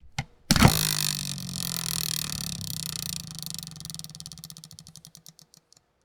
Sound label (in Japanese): ルーレット; board game casino mahjong